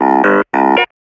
keys dirty buzzer; Loops; Phonky

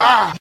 ah; ooh ahh

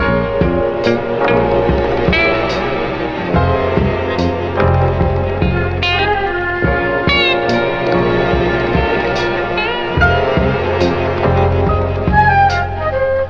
guitar and strings, Phonky Loops

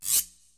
guiro; Misc Percussion

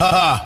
ha ha, announcer; ooh ahh

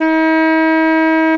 sax; Winds

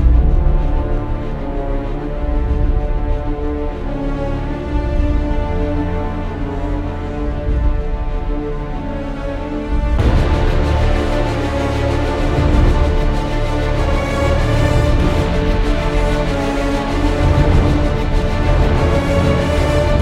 strings relentless, Sad Loops

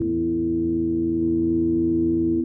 organus, Synths; Notes

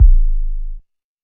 bummer, Kicks Medium

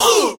"ooh", voice

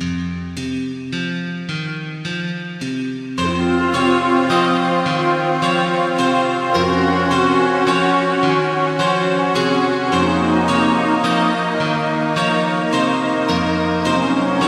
guitar, Sad

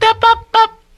bup bup bup, Voice; ooh ahh

